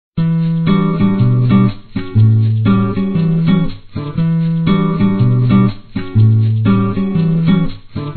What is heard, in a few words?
Guittar